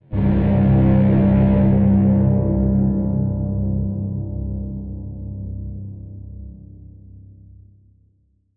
Danger Flourish; Insanely Twisted Shadow Planet

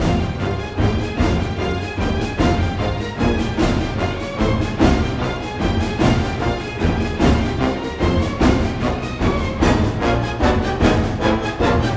Explosion Escape; Helios Explosion Escape; Star Trek

